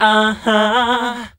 AAA HAAAAA; AMG - Vince Clarke Lucky Bastard

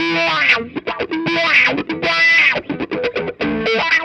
METAROCK; Sonic Foundry (Sony Creative Software); Drums from the Big Room The Mixes; Demo Projects

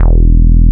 BASS, Tekno Industrial; East West

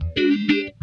SOFT FAZE, Funk Guitar; 90 s Sample CDs; Zero G

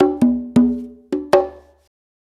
Blues Perc Loop; Sonic Foundry (Sony Creative Software); Loops & Samples Drum Tools

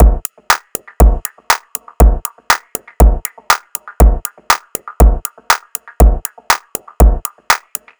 Straight Punch Beat, Drum Loop; Sonic Foundry; The Electronic Music Manuscript Collection; Drum Loop Structures

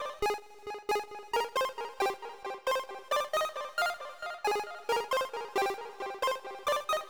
135 C64; Zero-G Trance Inducer; 90 s Sample CDs

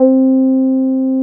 TB C5, TB-303; Masterbits; Vintage Voltage

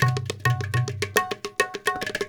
India, Heart of Asia

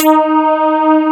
wet bass

